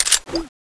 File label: reload clip out, weapons; bloodhunter; cstrike